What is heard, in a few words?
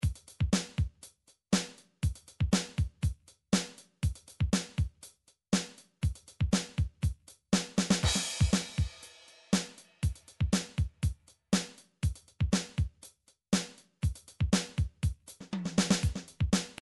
drums, midi drums